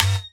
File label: Buzz; Ableton Live 12 Lite; Core Library; Drums; FX Hit; Unsounded